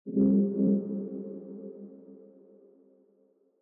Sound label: light mode on